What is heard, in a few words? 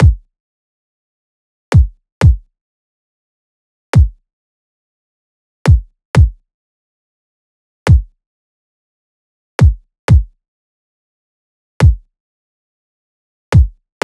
loop kick 122; Seismic Loops